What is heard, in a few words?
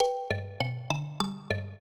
trio, mbira; Mozart